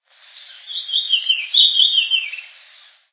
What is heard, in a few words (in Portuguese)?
coleira-do-norte